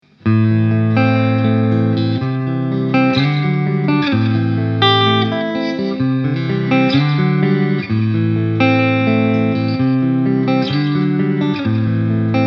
Hals clean; Danelectro 59X

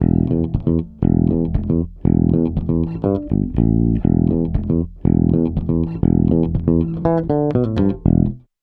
E-Bass; Tegeler Audio Vocal Leveler; Gain 8

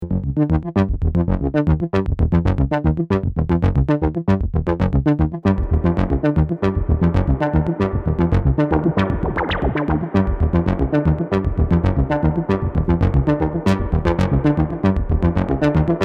Basslauf DAF Style Sägezahn + Reso steigend; system700

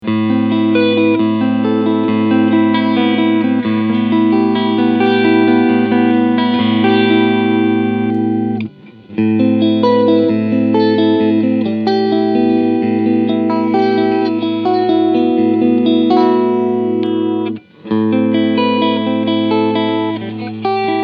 Schecter Traditional E Gitarre Cleansounds; Nick Johnston

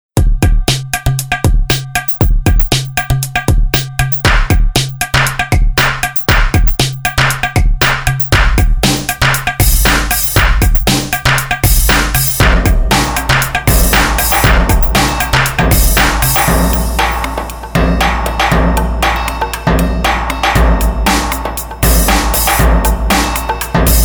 Maschinen Groove

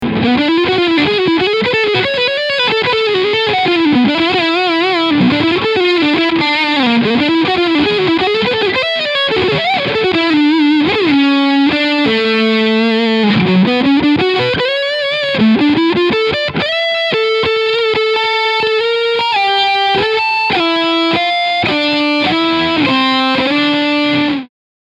Schecter C-1 Apocalypse E-Gitarre Leadsound beide PUs; Red Reign

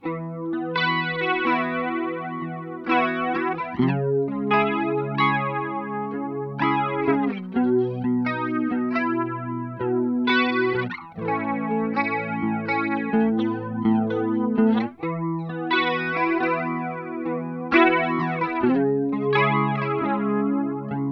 EHX WOTM color 1 Uhr range 14 Uhr rate 8 Uhr

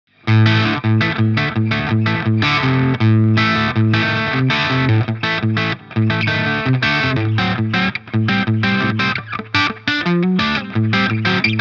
Steg chrunch; Yamaha Revstar RSS02T

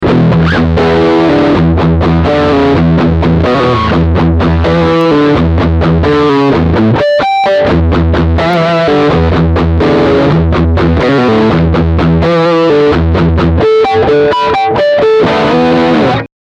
Riff beide Pickups

